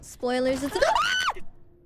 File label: Scream at jumpscare; IRyScream